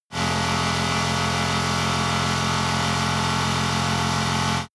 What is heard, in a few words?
motorcycle engine medium high constant rpm ascending; motorraeder; verkehr transport